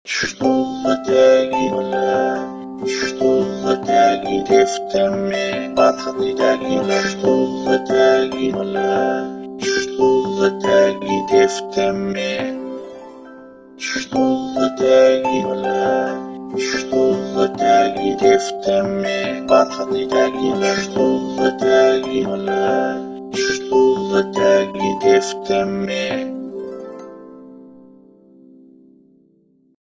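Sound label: Samsung alarm clock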